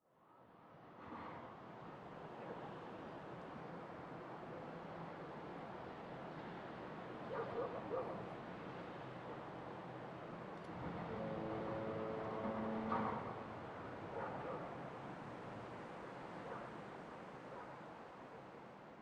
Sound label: ext level intro one shot; Titanfall 2